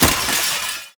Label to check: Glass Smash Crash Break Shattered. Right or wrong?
Right